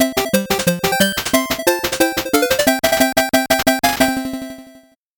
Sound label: 8bit jingle fast